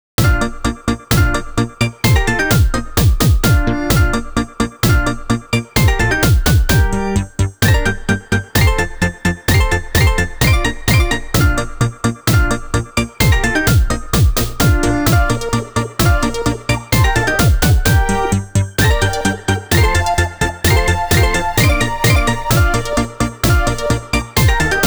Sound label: Turkish March Beethoven; Taiko no Tatsujin Doka to Oomori Nanadaime; PS2